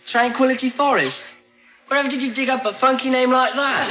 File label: Funky